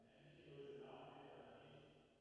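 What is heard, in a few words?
480 Reverb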